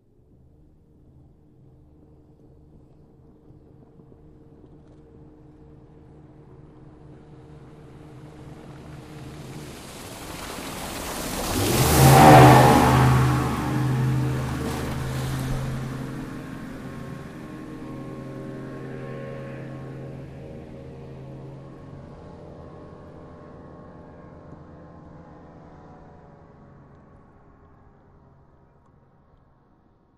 Ranger Boat By Fast With Accel And Shore Wash At Tail